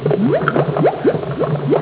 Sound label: Cauldron Bubbling